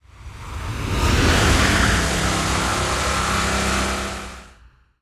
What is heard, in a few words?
Dreadbloon Rush